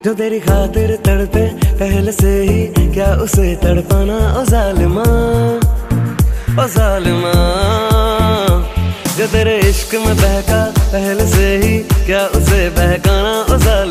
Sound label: hindi ringtone; bollywood ringtones